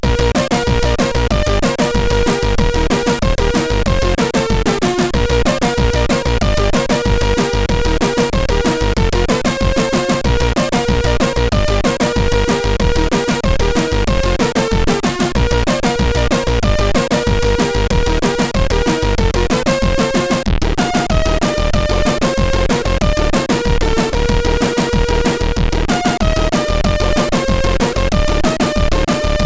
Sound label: Singing Cows tune, sid CSG8580R5